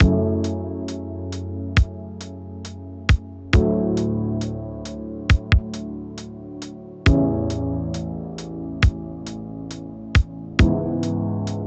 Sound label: Lo-Fi; Neon Lounge (RT 1.765)